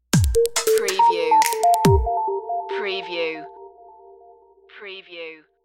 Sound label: subsequent stinger